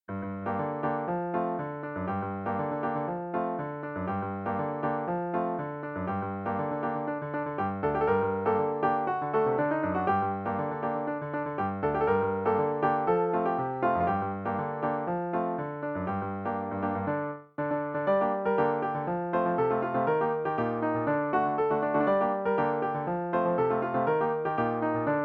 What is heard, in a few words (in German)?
kleines Klavierstückchen